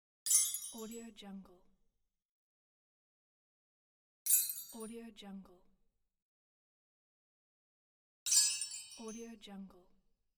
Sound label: chimes